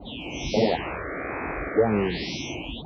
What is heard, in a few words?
lofar